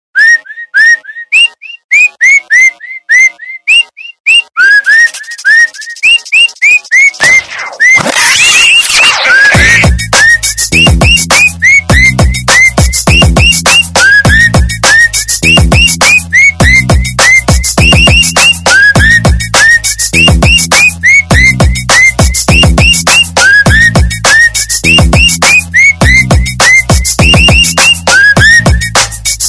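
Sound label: whistle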